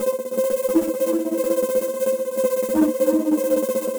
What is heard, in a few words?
Kaossilator processed (120BPM); musicradar